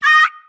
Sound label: "ah" cartoon, Voice; ooh ahh